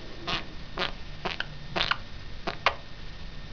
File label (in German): gepresstes schimpfen